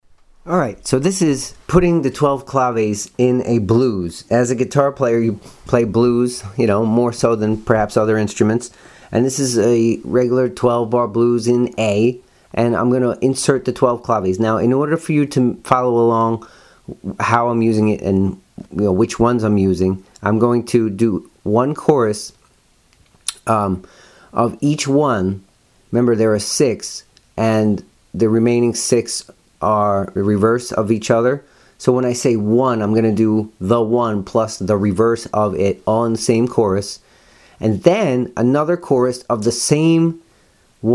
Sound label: blues with 12 claves intro